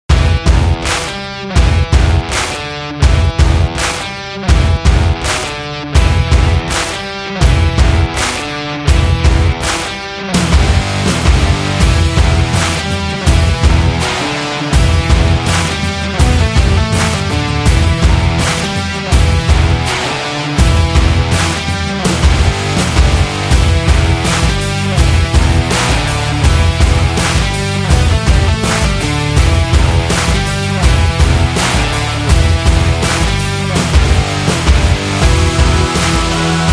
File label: Indie Rocks